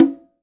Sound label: CONGA MUTED; Sonic Reality; Interactive Drum Kits